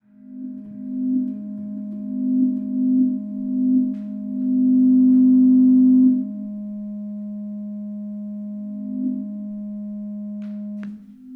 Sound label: ENSEMBLE